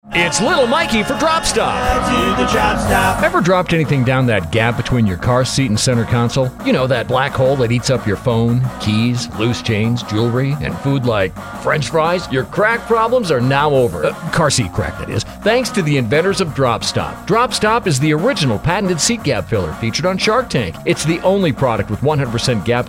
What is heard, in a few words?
JINGLE